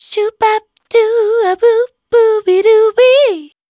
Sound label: FEMALE, Dance Vocals